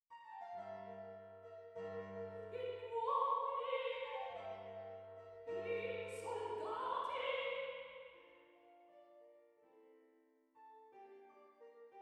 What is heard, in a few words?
Music Ambisonics; DTS